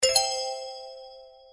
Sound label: info page open